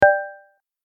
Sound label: the notification email